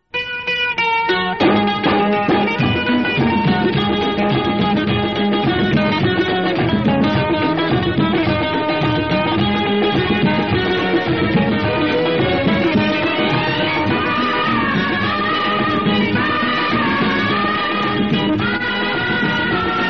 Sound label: Theme Instrumental; Chhalia (1960)